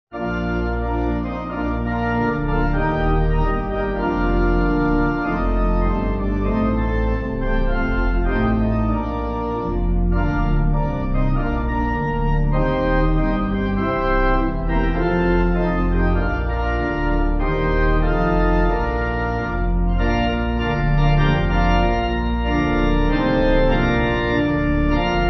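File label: Pipe, hymn backing; D.B. Towner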